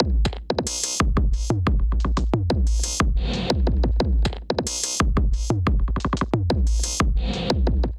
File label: Kit, Drums; Service Module Kit; Ableton; Glitch and Wash